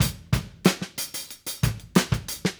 Call And Response Drums B Breakbeat, 70s Analog Funk; Sonic Foundry (Sony Creative Software); What it Is!